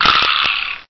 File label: Rattle; Cheesy Lo-Fi Sound Effects